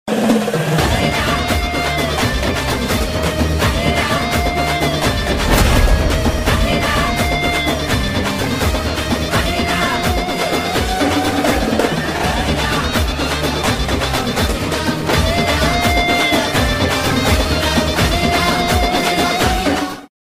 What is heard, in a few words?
mass bgm ringtone; bagheera theme; prabhu deva